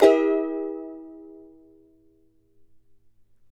CHAR F MJ U, charango; Spectrasonics; Hans Zimmer Guitars VOL-2; CHARANGO CHD

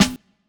Dance Drums

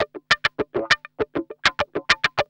GUI, licks

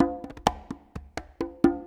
Loops 128BPM, Drumloops

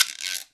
GUIRO; InVision Interactive; Percussion Slam; ITCHY

